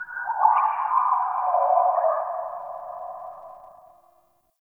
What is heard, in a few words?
Signature Call; Ambience; Corrupted Ode